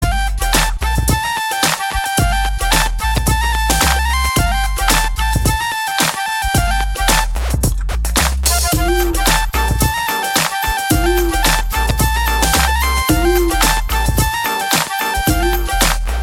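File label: background music Telugu Ringtone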